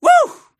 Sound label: woo